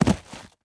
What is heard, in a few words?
bodyfall dirt